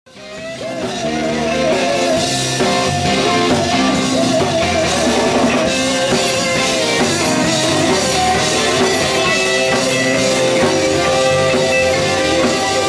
Guitar Solo with UniVibe (Roger Mayer Voodoo Vibe) On; Blues-Rock 2010